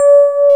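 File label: electric piano, soft, super electric piano; Roland L-CD701; Pop Pianos 4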